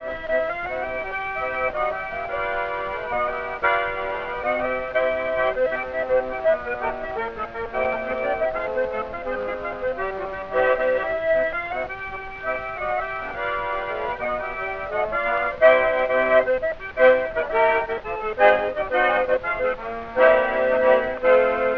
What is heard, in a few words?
saxophone with orchestra; variety stage